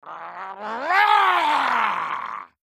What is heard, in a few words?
raaaaaaaahhhhhhhh